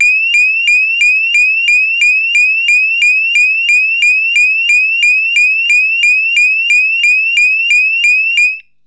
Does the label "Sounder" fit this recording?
Yes